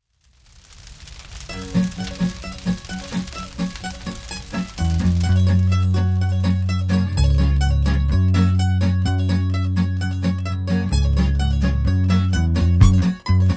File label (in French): comptine music; au feu les pompiers